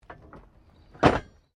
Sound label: Train Points Manual Operate; Bellarine Tourist railway vehicles; Trains